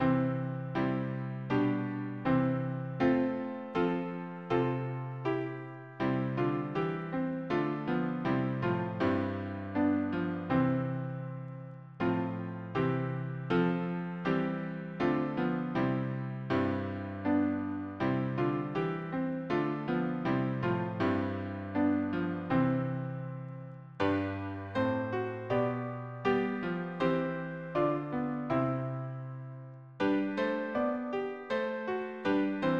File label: harmony piano